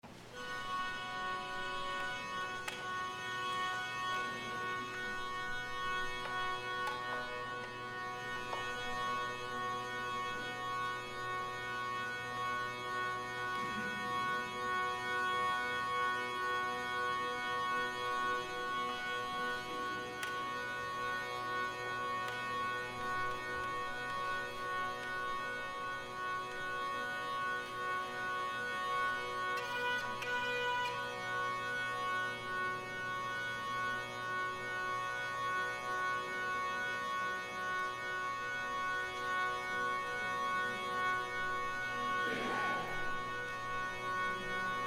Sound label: Musette